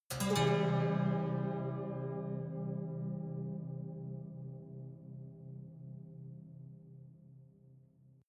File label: haunted strings chord major